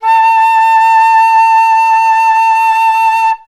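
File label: flutes A4; Best Service; Peter Siedlaczek's Advanced Orchestra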